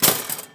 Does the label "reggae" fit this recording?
No